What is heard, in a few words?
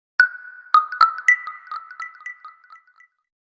huawei notification